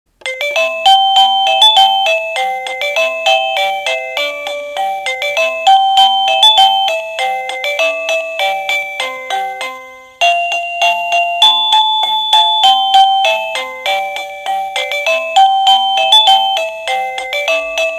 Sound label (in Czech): melodie